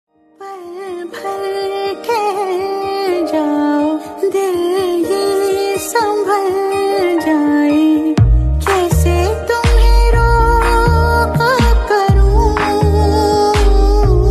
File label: Female Song Ringtone